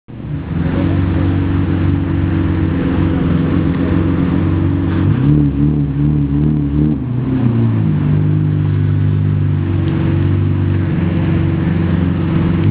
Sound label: Lamborghini Murcielago